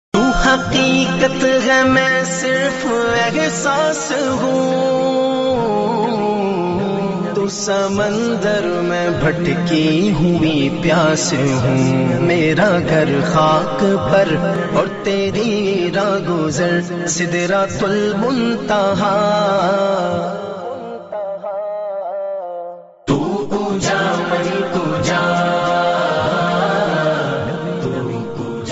Islamic Naat Ringtone

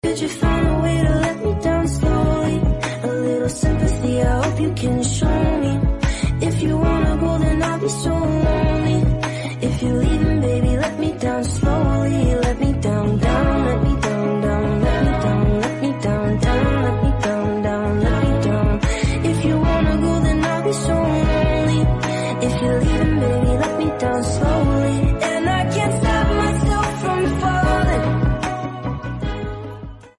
English Song

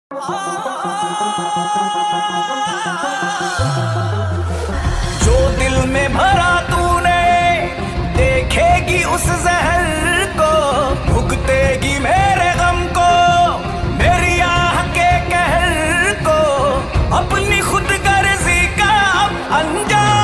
Heart Touching Sad

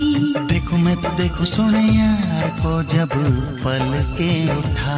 Old Song Ringtone

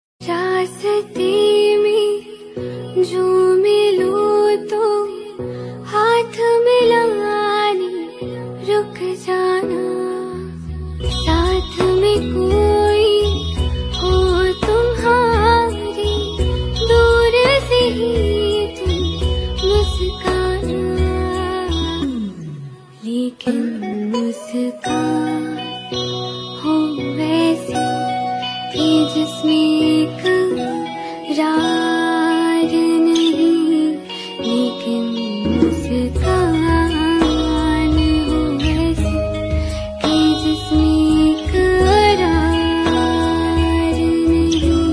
Hindi Song Ringtone

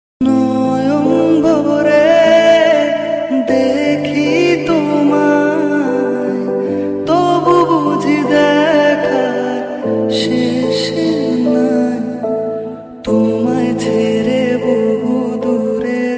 Bengali Ringtone

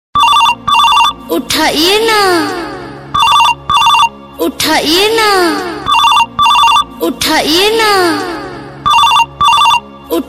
Ringtone Female

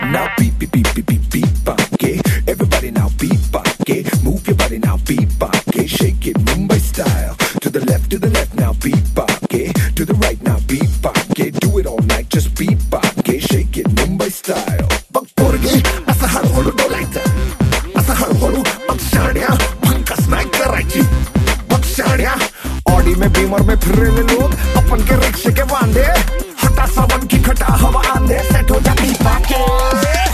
Remix Song Ringtone

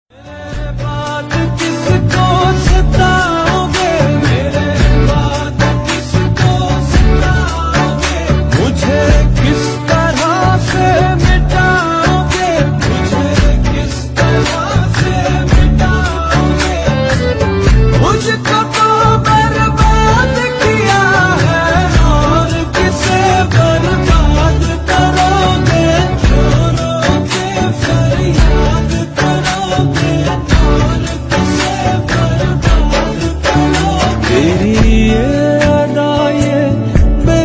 Sad Song Ringtone

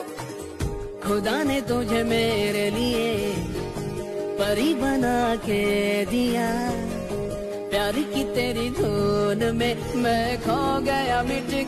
Movie Song in Hindi

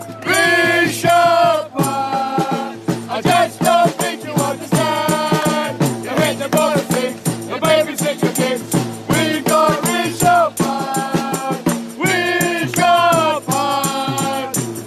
Song Ringtone By Indian Fans; We Got Rishabh Pant

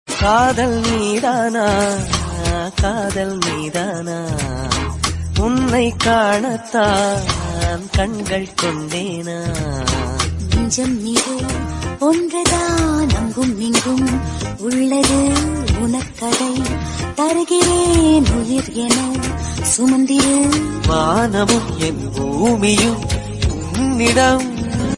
Male Version Tamil Song; ringtones

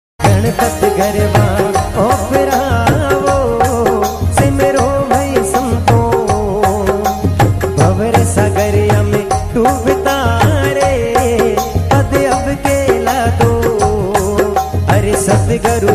Marwadi Bhajan Ringtone

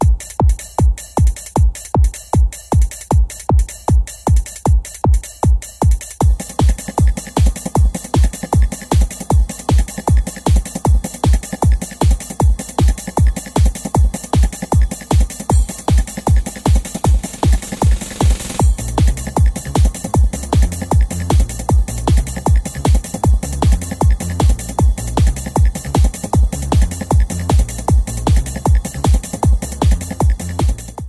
Trance Mobile Ringtone; ringtones

